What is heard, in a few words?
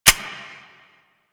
Sniper Low Clip, Weapons; DE; Warframe; Tenno; Quad Sniper